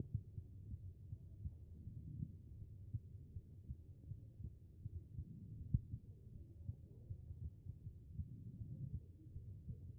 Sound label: HEART B MODE